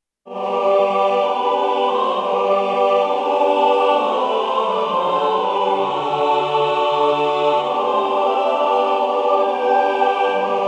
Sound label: canticorum tutti